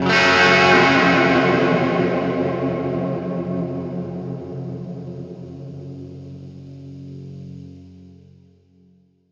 western guitar stab; Spaghetti Western

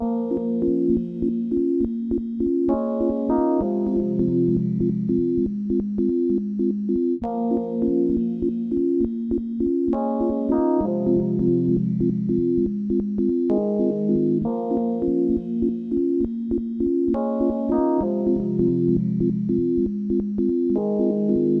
Intro + Bass

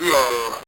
enemy death, bitcrushed voice one shots

